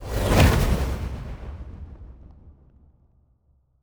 science ship move; aquatics

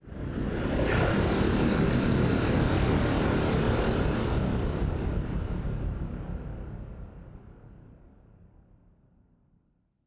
ship move; juggernaut; Stellaris; machineage

